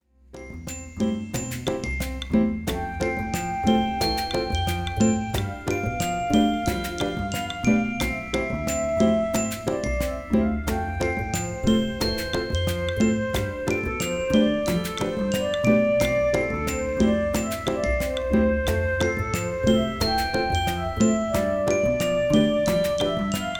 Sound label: Jungle trail bpm90 C Example of Main Menu